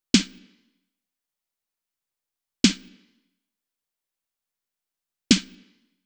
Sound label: Snare, Snare Patterns; Beat Components